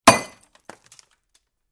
Beer Bottle Break; Single Glass Bottle Breaks With Pieces Falling. Quick.